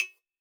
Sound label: Tech Drum Hit, techno 120bpm